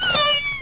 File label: CAT